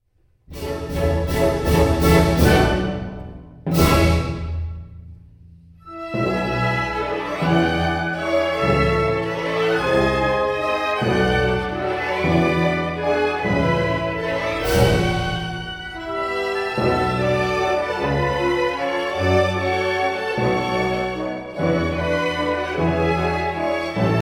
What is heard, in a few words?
Laurencia Male Variation; Frondoso